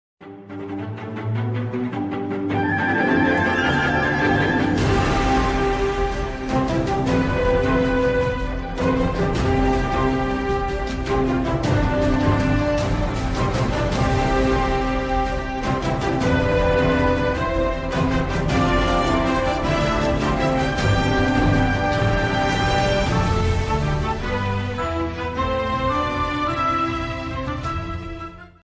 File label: ringtone; all might